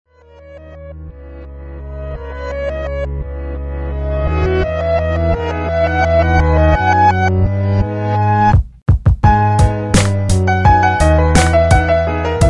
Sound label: Nokia 2610 ringtone mix